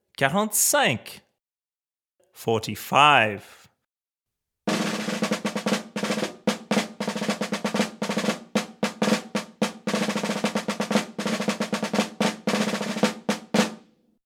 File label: Snare Drum